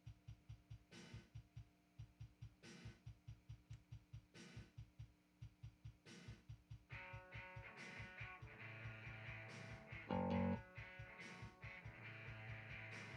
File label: Bass Recording; Sony ACID Pro 6.0